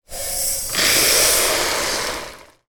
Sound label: food couscous raw poured into trash; sound effects one